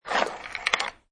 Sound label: Gear; Generic metal tool pick up sound; Hand Tools